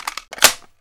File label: p90 clip in; garrysmod; p90cstm